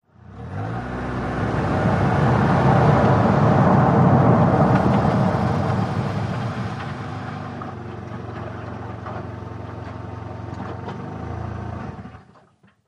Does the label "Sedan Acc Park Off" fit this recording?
Yes